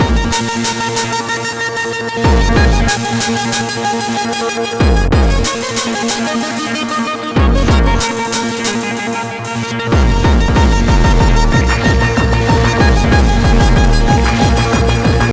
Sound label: bass test